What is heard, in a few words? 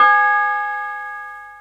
Phat Synth